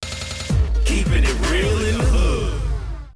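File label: radio jingle; The 'Hood 98.4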